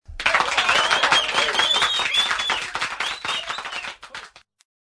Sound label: Claping Hands